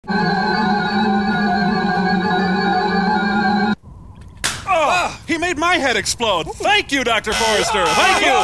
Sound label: head explode